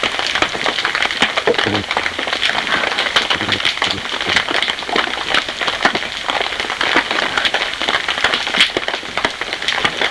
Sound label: Hole Open Bay East, hydrophone